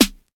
original snare single hit a sharp key, acoustic snare tuned to the A# note